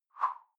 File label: whoosh simple slash big high; swooshes-swishes